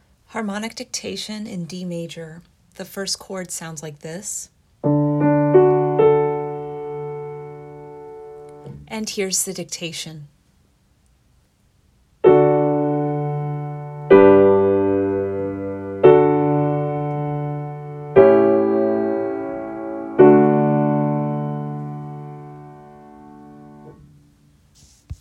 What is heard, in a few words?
harmonic dictation audio